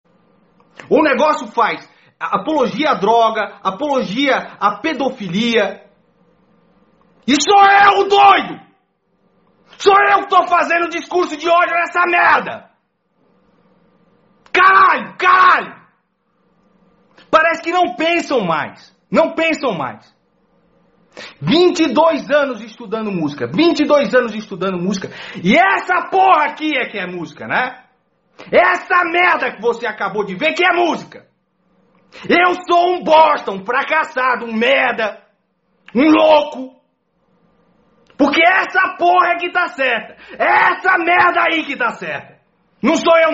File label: ringtone; podia ser pior